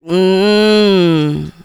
MMMMM VOX; USB Soundscan vol.18

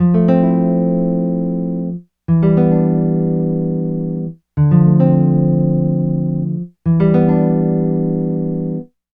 Nylon Guitar; Sonic Foundry (Sony Creative Software); Mac Money; Guitars

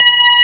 B3 Lo Dist Slow A4; mt-32

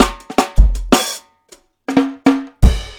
160 live jungle, breaks

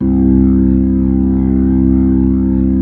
B-3

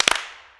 CLAPS, SINGLE CLAPS; Best Service; World Colours

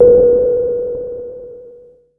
EFX, SFX KIT; Wizoo - Platinum 24 Electronic

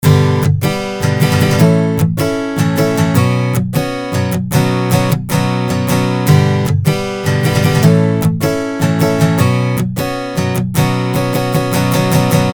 strumming test; random and out of place

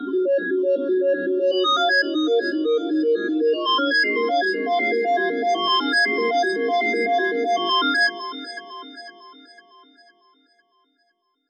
Square, lead arp chord melody

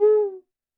percussion, Roland TD-20

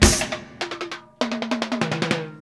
Fills, Roland; Sound & Vision - Gigapack I; Perc

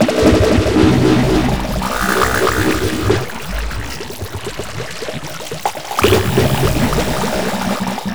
WATER; USB Soundscan vol.33; AKAI; Disjointed Textures

